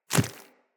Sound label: Inf Small Walk Default, Footsteps; Infested